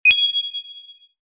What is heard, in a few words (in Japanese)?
hirameki